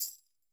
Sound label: TAM HIT, PERC; Sampleheads; NYC Percussionworks; SF PERC VOL1